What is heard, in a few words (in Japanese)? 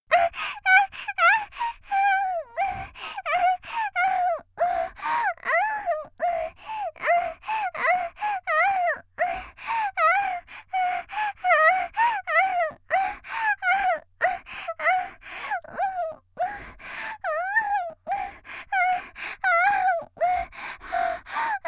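（少女）「喘ぎ」嬉しそうに, 元気な少女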